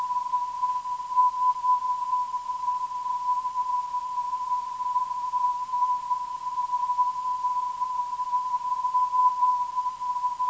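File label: non-directional beacon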